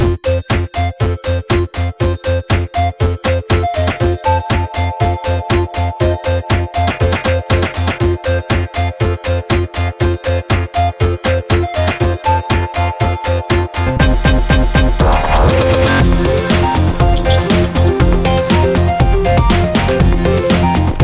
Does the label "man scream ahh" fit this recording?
No